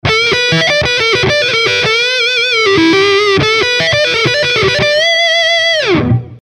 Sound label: Kreamy; REV100HD